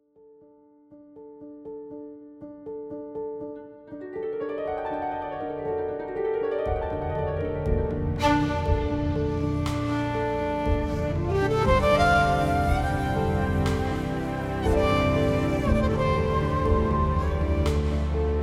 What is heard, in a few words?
Orchestral; Yamaha Genos2 Demo Track